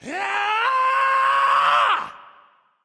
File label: battle cry; smite; loki